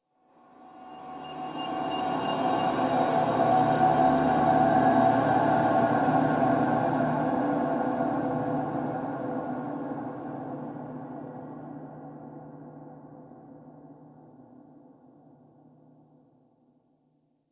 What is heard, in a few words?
Exterior Texture, Ambience; Warframe; Rail Jack